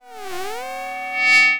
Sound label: Sci-Fi Electronic Warning Tone; Science Fiction